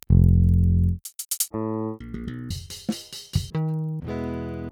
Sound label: Pultec; Virtual Analog